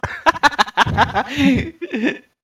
risada

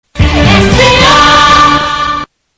vinheta, Radio; Radio Gazeta

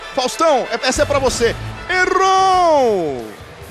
vinheta; faustao errou; everaldo marques; espn

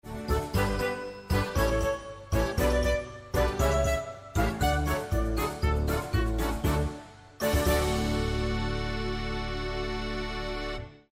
musica tradicional alemanha

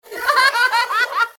risada

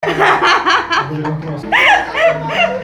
risada

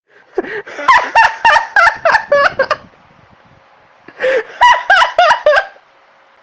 dando risada; preto

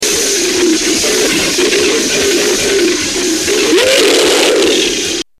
gato guerreiro

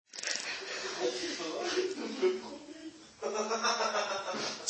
risada

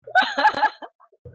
risada

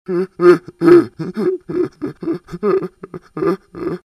risada

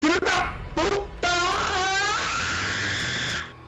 canto do pombo

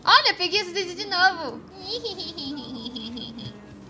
hihihihi

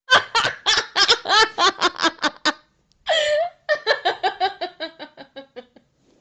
risada

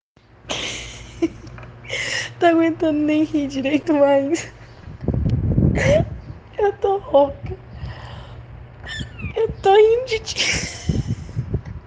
rindo de ti

